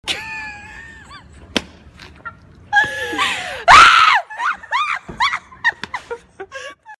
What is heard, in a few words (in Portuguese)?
violino humano